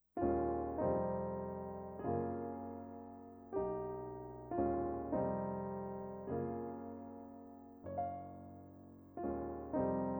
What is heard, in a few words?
Four Piano Blues; Classical Contemplation